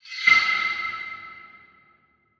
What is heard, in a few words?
designer high scrape hit; SoundsCrate